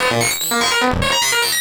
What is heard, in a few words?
Atonaloop, Industrial Analog